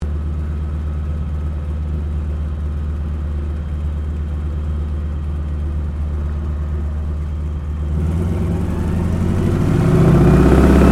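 AIRCRAFT AT 6D HARVARD MK III TAKEOFF INTERIOR, Harvard Training Aircraft; Airplanes (Sound Effects:Vehicles)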